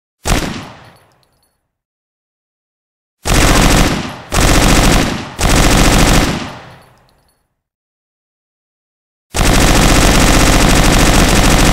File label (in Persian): Thompson, اسلحه